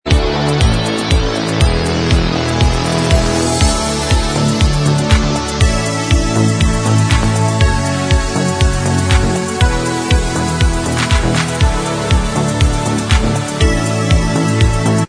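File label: dance remix Instrumental Track, backing tracks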